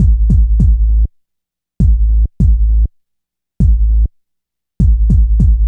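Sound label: tag kick loop, hip hop; E-MU Formula 4000 Series Vol. 1; Hip Hop Nation; Laser Tag 100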